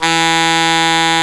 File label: D#2, REED